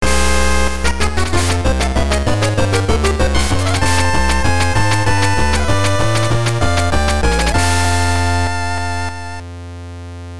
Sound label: Game Over Jingle; pop n music gb disney tunes